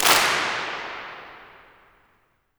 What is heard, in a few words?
CLAP, MONDO CLAP